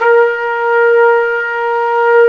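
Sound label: WND, Hybrid Winds, Flute & Flugel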